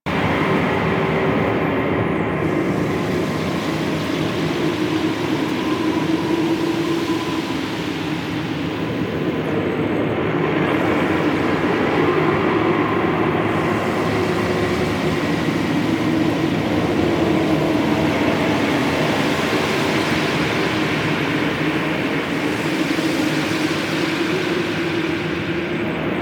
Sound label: Outpost Interior Amb; Warframe; Corpus